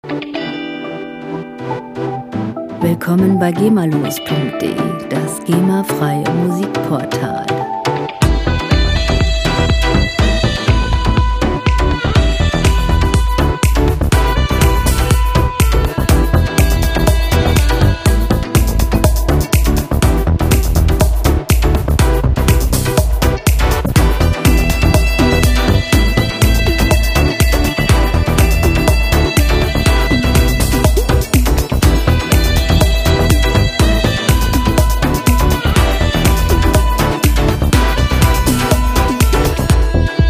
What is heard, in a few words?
sax